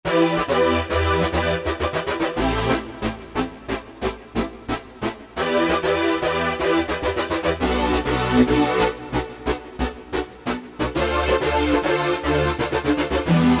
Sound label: Stabba